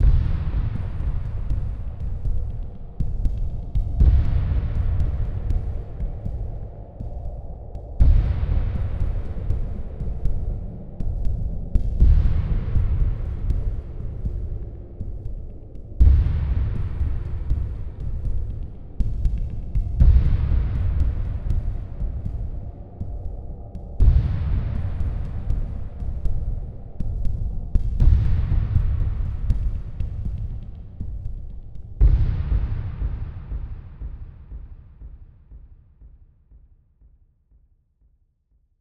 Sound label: Delay Drone; Cinematic Trailer Music; Bonus - FX & Transitions; BlueFX